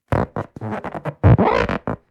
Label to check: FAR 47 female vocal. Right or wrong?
Wrong